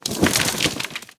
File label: ability stone wall down